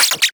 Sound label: scratch, Interface Sounds; Kenney's Sound Pack